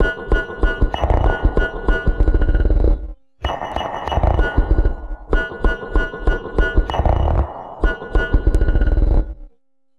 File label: Structured Rhythm, IDM Rhythms